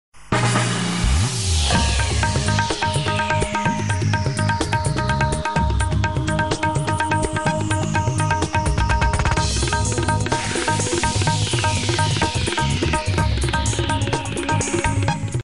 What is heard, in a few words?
Braking News